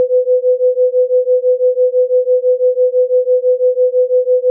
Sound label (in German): Schwebung 500 506 Hz; Тремоло биениями: частоты 500 и 506 Гц, частота биений 6 Гц; Вибрато